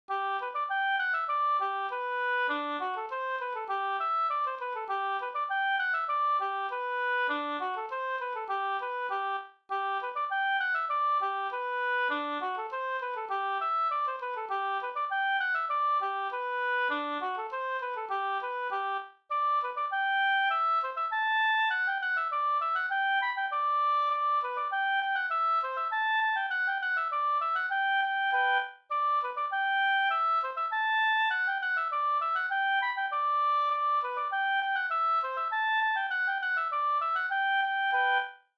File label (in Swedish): reinlender